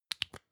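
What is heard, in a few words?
lipstick close, Bathroom; haus-garten; badezimmer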